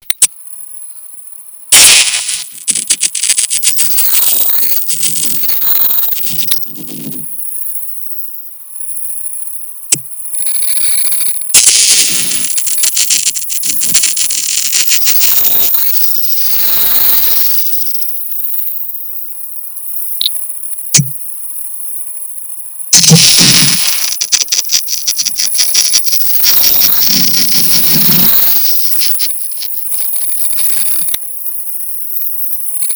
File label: ventilator